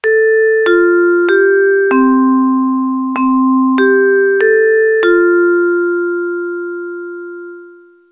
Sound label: 8 klang vibraphone; echo